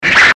fight kung fu swish

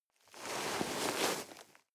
nylon leather soft

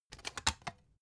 cd take out tonal

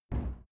cupboard kitchen close subdued

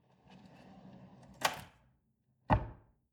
drawer large trash close hard

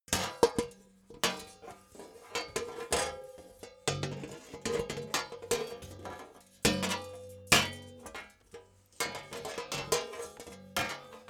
metal plates handling jingle

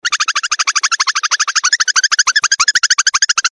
cirping

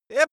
cartoon male jump prepare

